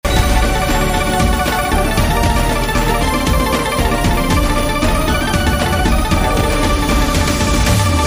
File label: Mega Win Bgm